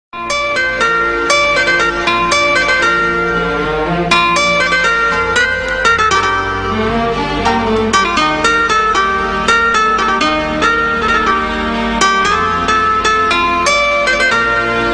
90s guitar

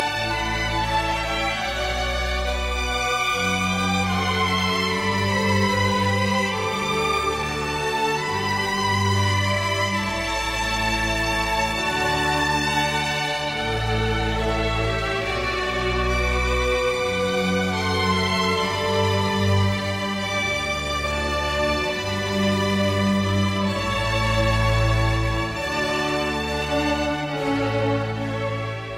classical violin; Electronica

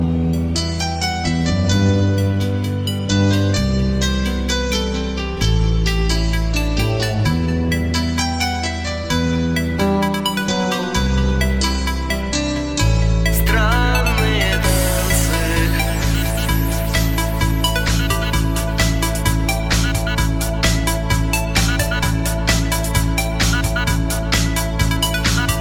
cute piano; Electronica

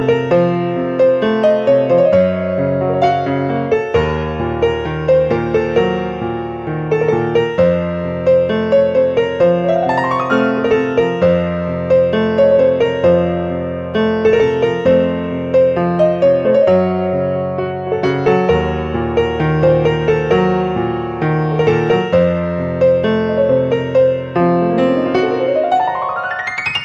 light piano; Electronica

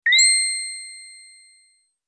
pitched so high sms

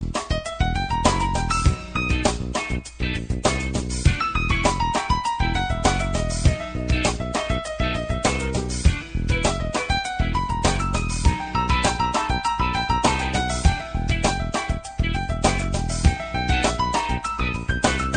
piano fusion; Electronica

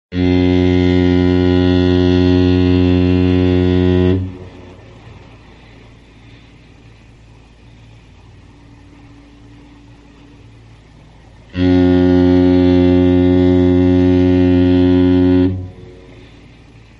fog horn

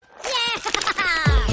hahahaha

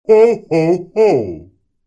ho ho ho, ringtone

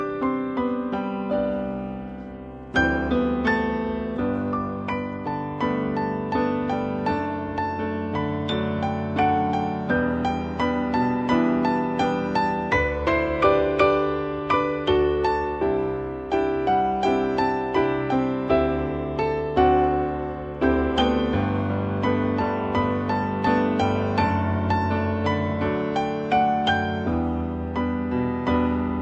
instrumental piano

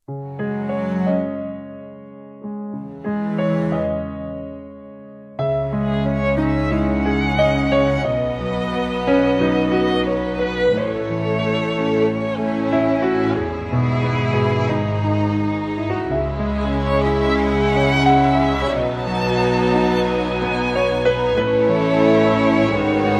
violin romantic song; Electronica

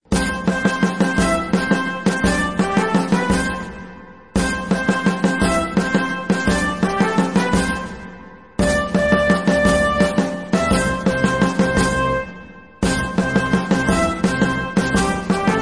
military march

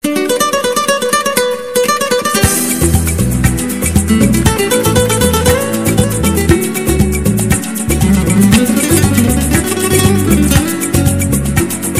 soft guitar music; Electronica

